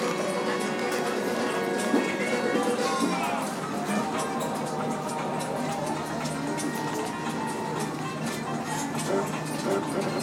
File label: Star Wars Robot; Sapporo; Tokyu Hands